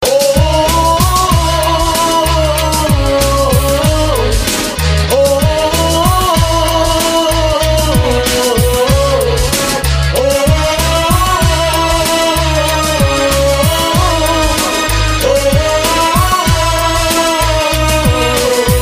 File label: Tadap Alap